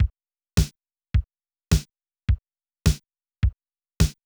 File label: drums